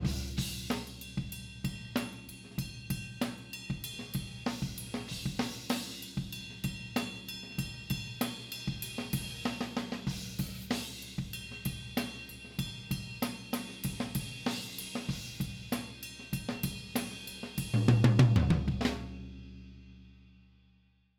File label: Toms